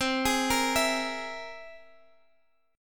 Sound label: C Augmented 7th, Guitar Standard, chord; arpeggiated; x,x,10,13,11,12; capo=2